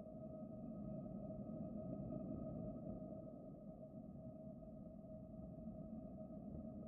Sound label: amb Space